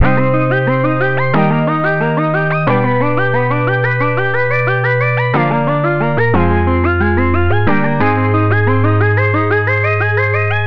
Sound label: arpeggio